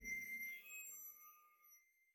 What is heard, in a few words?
Mirror Hover; netent